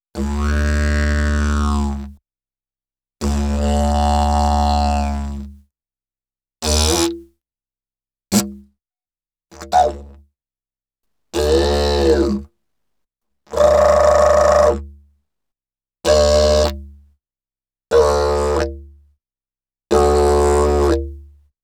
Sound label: Didgeridoo And Overtone; Good Stuff Audio